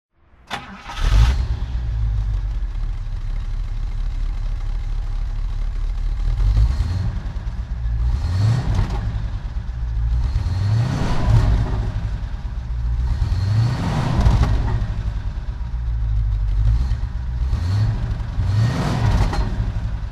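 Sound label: bentley convertible throttle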